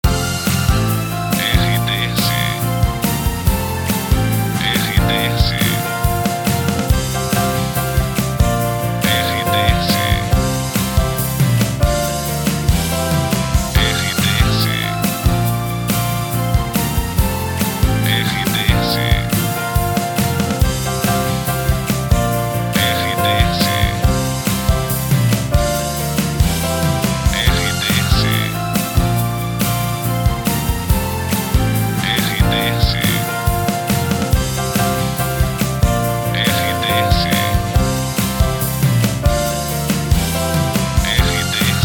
TRILHA PARA LOCUCAO; Pacote Web Net; Web Rádios

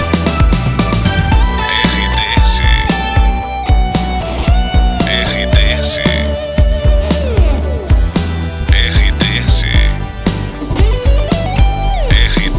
TRILHA PARA LOCUCAO

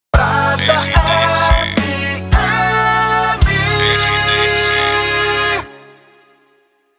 PONTE RADIO COM SERTANEJO

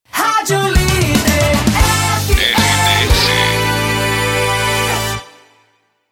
PONTE RADIO; Rádio Líder

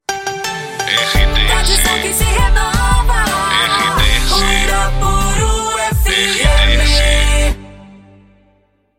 PASSAGEM; Uirapuru

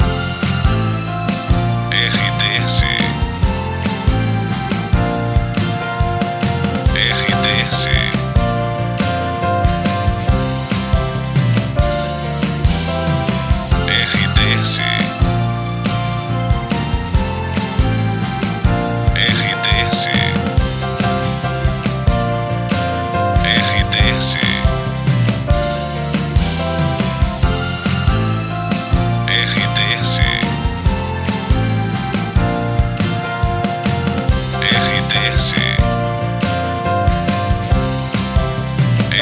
trilha para locução; Santos FM

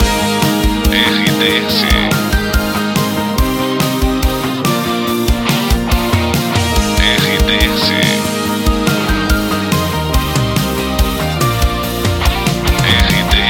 trilha locução; Pacote 92 FM, Timbó/SC. Pacote com característica pop rock

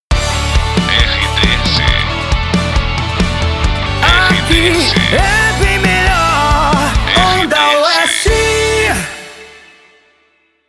passagem; Pacote Onda Oeste FM, Piumhi/MG. uma estação de rádio pop rock

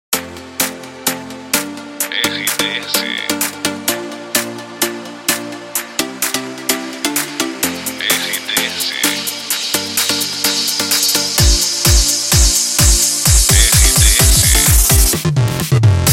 trilha para locução; Pacote Maximus FM de Rio Paranaíba/MG. Emissora com perfil Jovem

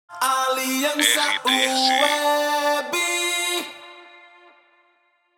ACAPELA; Aliança Web

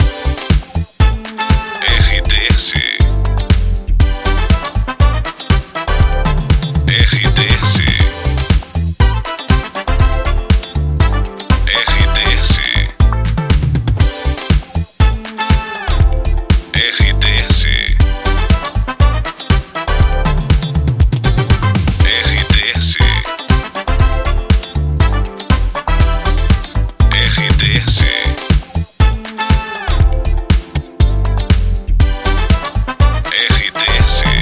trilha para locução bom dia; Pacote Itapoan FM, Salvador/BA, uma emissora com característica jovem

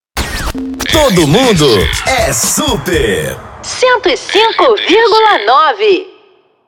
intercom

